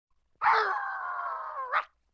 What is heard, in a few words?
LoFi Synthetic Dog Squawk; Vintage Cartoon; Comedy Vocals